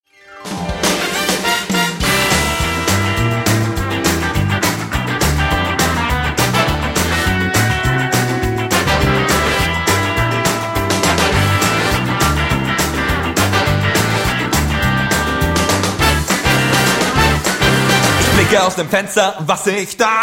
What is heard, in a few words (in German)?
rocknroll lied